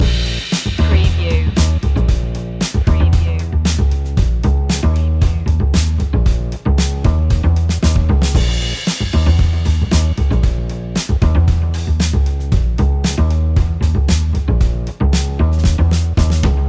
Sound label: funky jazzy flow loop